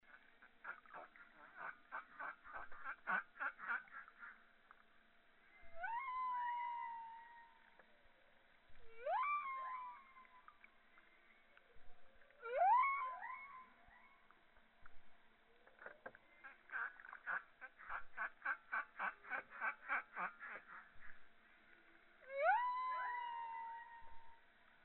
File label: philippines humpback songs